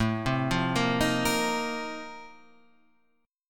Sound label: Bb Major 7th Suspended 2nd Suspended 4th